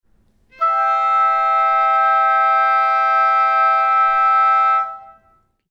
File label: chord